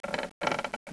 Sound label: wood lite creak; de port island